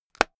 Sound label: spectacles case close hard